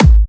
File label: Trance Kicks